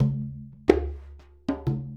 Congas; Essential Percussion

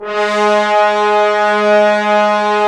BRS, Roland LA Composer; Tbns

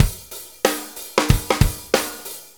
Shuffle Loop, Full Kit Loops; Drum Tools; Shuffles; Sonic Foundry (Sony Creative Software); Loops & Samples Drum Tools

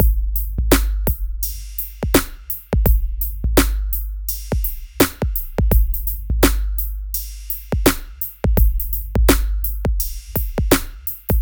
Drums, Hip Hop

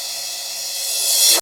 Reverse Cymbal, Yamaha DTXpress

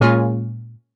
stab warm, stabs

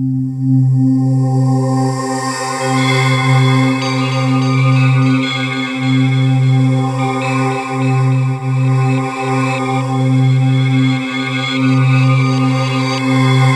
ice-man, pads; Rubber Chicken Software Sample Bank; REAL INSTRMT

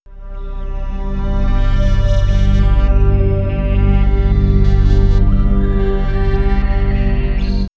Swirls, pads; X-Static Goldmine

